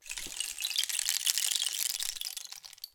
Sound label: Rain Stick, Percussion Samples